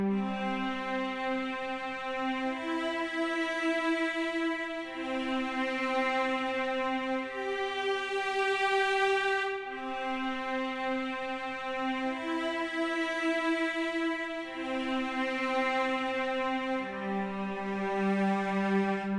Cello; Toki Tori 2

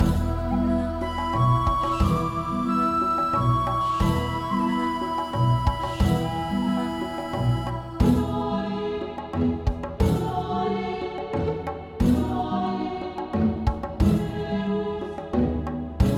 Open Water Intro cut version; Ocean Side

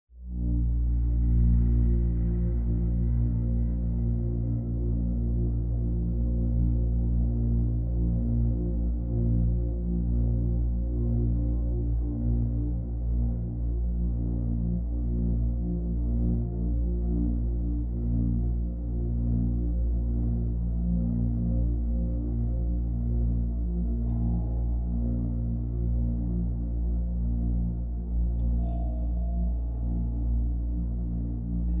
DARK PAD LOVELY TEXTURE; Semblance

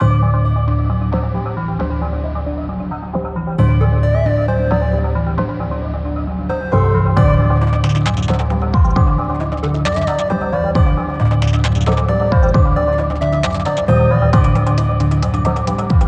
Game Over; onirism

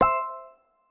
Match; Ellen's Garden Restoration; android

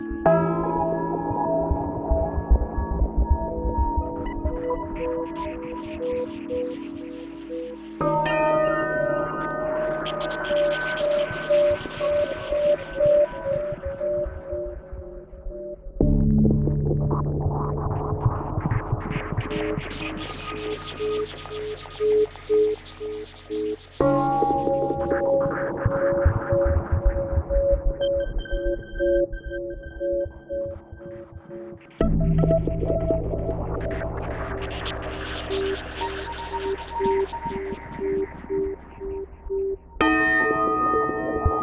Creeping Bell Ambience; original soundtrack 2015; Arcana Kir

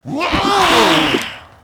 Ghost Attack; Character Ghost Attack2

